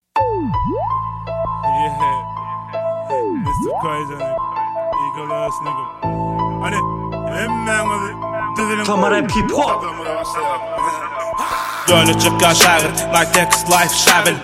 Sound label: punchline tmrap hiphop, Turkmen Rap